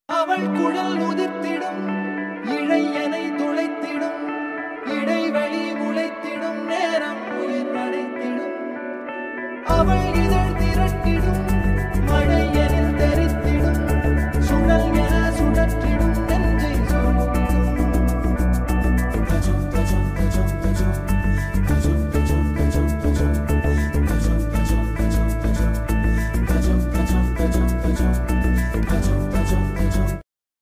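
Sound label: tamil love ringtone background music ringtone; caron tunes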